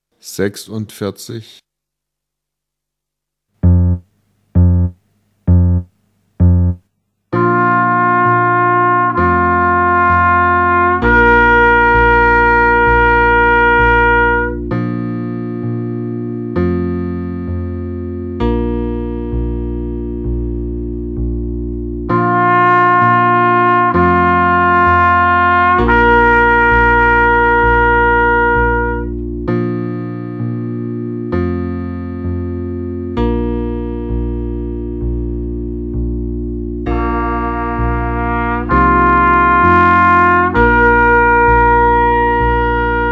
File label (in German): Klang, Hornschule; 5 verschiedene Horntoene